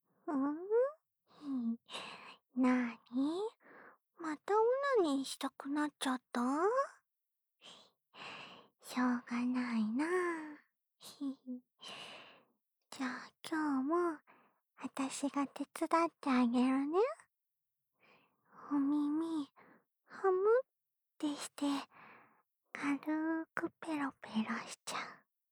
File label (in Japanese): 浅い 耳舐め（右耳）; オナサポ用